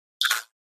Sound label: Flash Gear Rattle